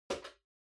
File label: Flip Clock Far Button Off Strong